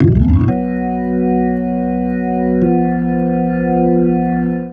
Organ Lick; Ambient Grooves; Organs Licks

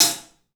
HAT, CLUBHAT, 3D Drums; 3D Sound

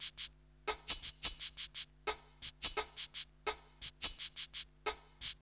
PERC LP, Hip Hop R n B; USB Soundscan vol.19; AKAI

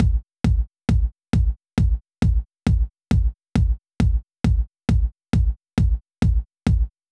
135 Kick; Zero-G Trance Inducer; 90 s Sample CDs

